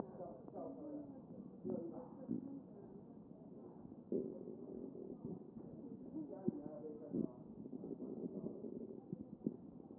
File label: A CHEST